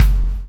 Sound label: CHAIN; Spectrasonics - Backbeat